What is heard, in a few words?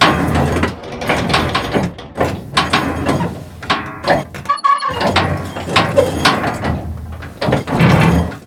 turret; World of Tanks